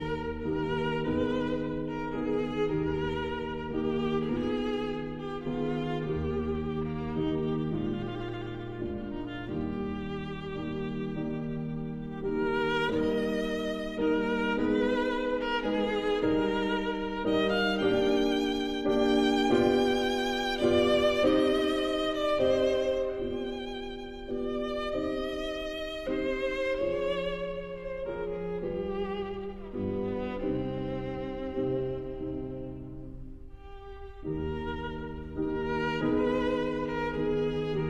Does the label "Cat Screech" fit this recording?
No